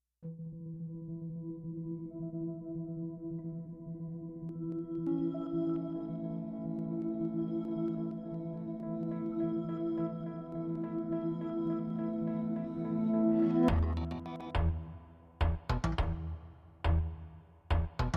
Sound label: Chimes